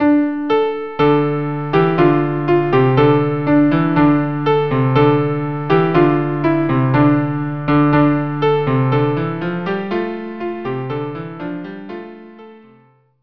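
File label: violin cello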